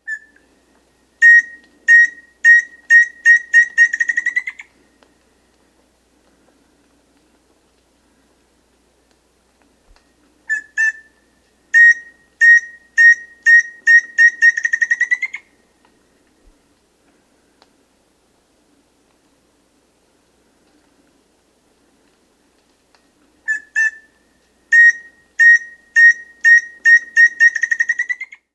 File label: Chestnut-Naped Antpitta, Nature's Songs, S. America